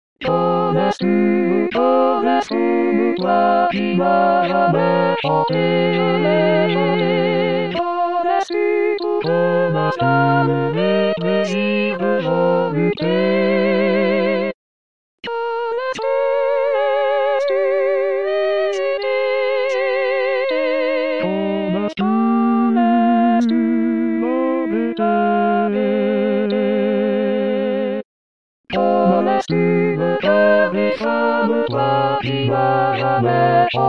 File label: tutti